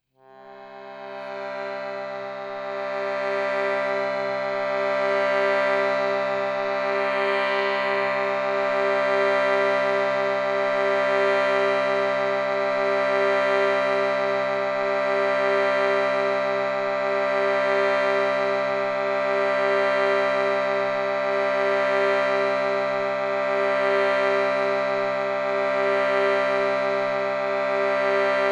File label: Shrutti Box C G